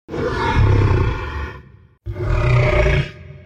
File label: rumble